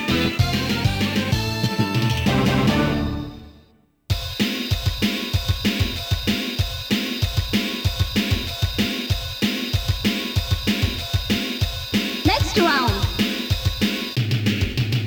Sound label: SET CLEAR, NEXT ROUND; Fighter's History Dynamite; Flying Power Disc